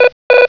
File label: pong blerp blerp; ruby-rudl; rudl-0.8